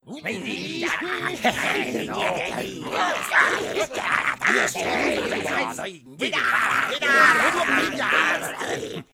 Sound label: Goblin Babbling